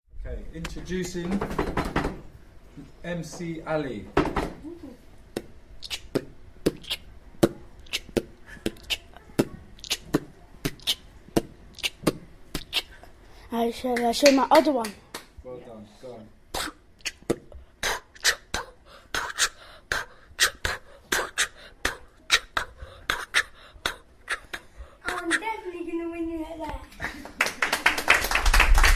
beatboxing battle; westminster myvoice roadshow

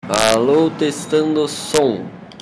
yay

